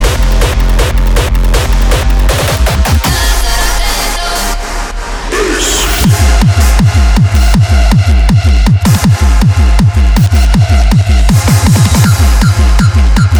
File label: Bulgarian Rhythm; Radio Edit